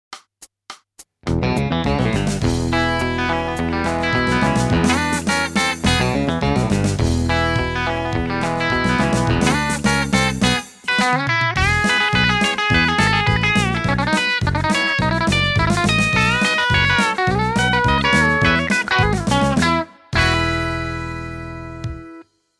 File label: country